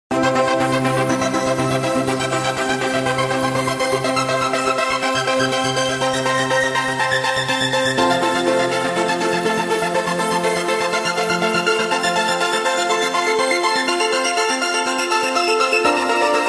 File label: synthesizer ringtone; i phone 8